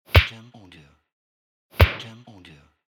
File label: Hits